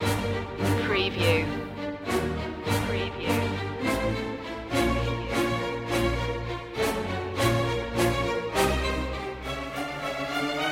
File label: strings only